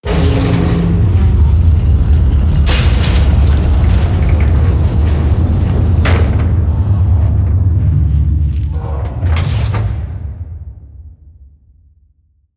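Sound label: Ball Hall Bridge Door Close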